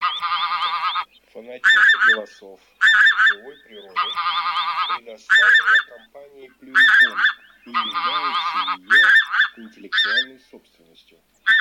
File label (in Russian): Гуменник Oca Granaiola; chip mini gusiniy 5B plurifon